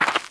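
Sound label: female dirt, footstep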